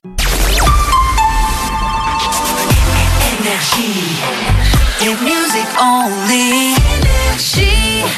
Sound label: Jingles; NRJ Shotgun ID (2025)